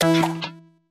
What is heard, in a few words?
game show tile flip